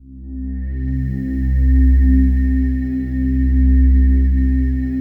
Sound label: PAD, DIGI-PADS